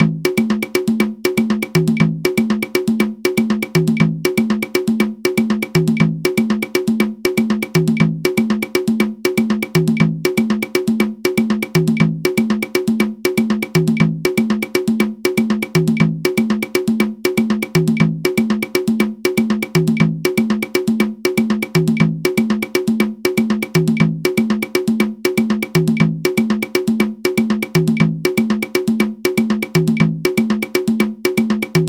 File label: yuka